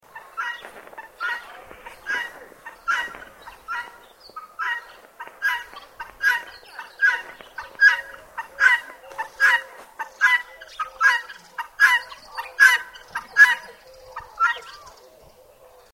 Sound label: guinea fowl sound